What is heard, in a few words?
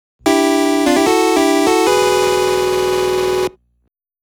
Battle Victory; The Sword of Hope